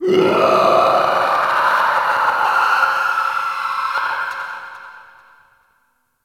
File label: Infested Runner Dist Vocal; Warframe; Runners